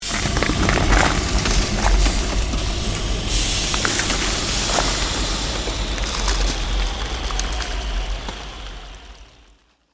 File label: CREATED MAGIC SPELL BLIZZARD SHARDS